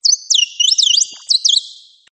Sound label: Digital birds